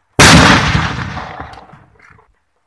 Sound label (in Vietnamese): tieng phao no phao bi